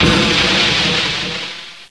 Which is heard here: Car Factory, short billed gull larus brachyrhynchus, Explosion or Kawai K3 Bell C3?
Explosion